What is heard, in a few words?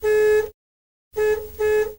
R4 Horn, Renault 4